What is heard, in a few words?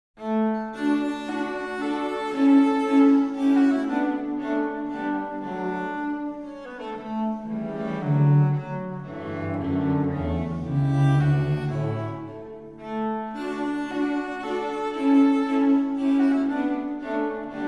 Menuet Allegretto